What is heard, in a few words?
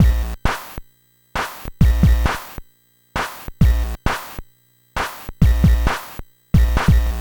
NES Drum Loop; Nintendo Entertainment System; Drums; Chiptune; Sonic Foundry (Sony Creative Software)